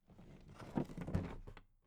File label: toolbox put down soft